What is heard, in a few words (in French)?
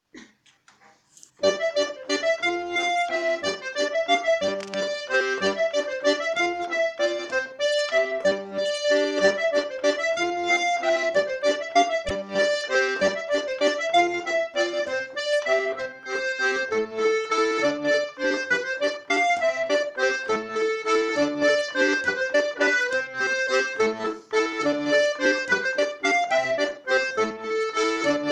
bourrée lent G major bpm 196; Musiques et danses traditionnelles; lo roc de Carlat; Rondeau 2025